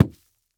wall dirt; Golden Crayon Sounds

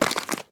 ice step; Golden Crayon Sounds

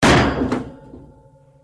steel fall; Golden Crayon Sounds

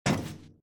grate step; Golden Crayon Sounds

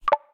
record end, ChromeOS Sounds

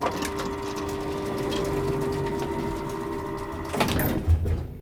elevator open; Golden Crayon Sounds

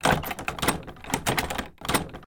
door loop; Golden Crayon Sounds

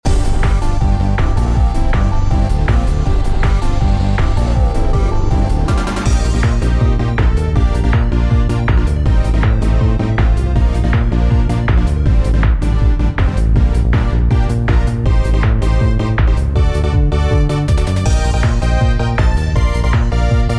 tutorial battle; Manamon Music; Manamon 2

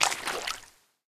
marsh step; Golden Crayon Sounds

